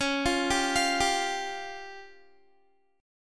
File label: Db Major Flat 5th Db down Guitar; DbMb5; arpeggiated; tuning=4F,4A,5C,5G,5C,6E